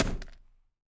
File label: WOOD, SMASHING; AKAI S6000 CD-ROM - Volume 6